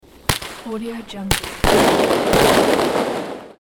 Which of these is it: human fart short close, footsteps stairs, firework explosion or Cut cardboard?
firework explosion